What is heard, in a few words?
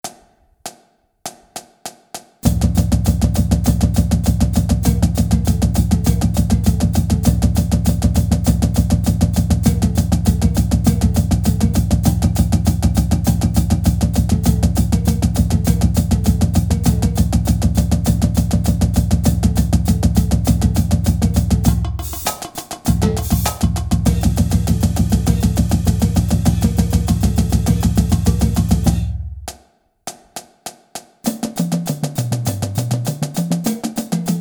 Stick Control, drumline